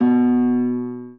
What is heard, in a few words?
spanish guitar